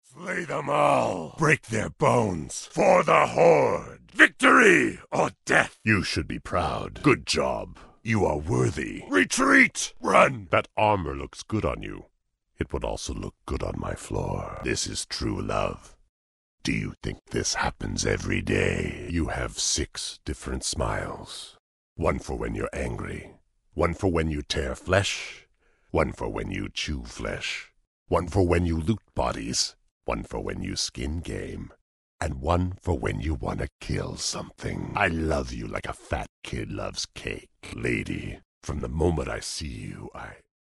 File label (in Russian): zvuki orkov